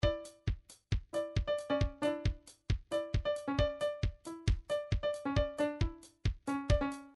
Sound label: trance midi loops beats; newtronic trance constuction kit